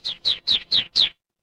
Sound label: animal bird